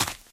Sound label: dirt, footsteps run; player; source engine; left 4 dead 2; survivor